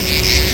NIGHT, Fairlight CMI, ANIMALS

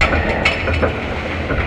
MACHINES

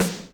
SDind, Electric Snare, Yamaha DTXpress

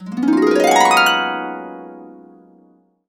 GB MAJ, Harps; Complete Harps; USB Soundscan vol.62; AKAI

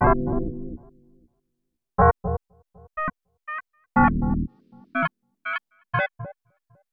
Tape Arp; Sonic Foundry (Sony Creative Software); Ma Ja Le Ethereal Textures

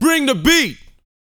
VOX, Vocal, R&B Dance, HIP-HOP

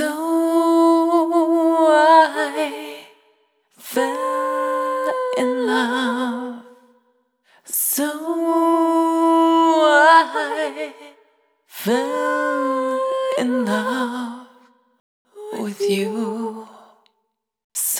Multi Voice Loops, Vocal Samples; Charon; Sonic Foundry (Sony Creative Software) - Pocket Diva World Class Vocal Samples

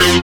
SYN, dance; Sound & Vision - Gigapack II CD 1

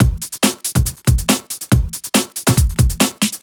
Break Beats, 140 BPM

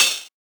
Tamborine, 707; classic machines; 707mod

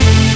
chord A; Techno Trance Essentials; CHORDS

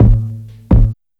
DRUMLOOP, Ragga & Dub Reggae; AKAI; USB Soundscan vol.27; DRUMLOOPS

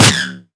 SNARE synth

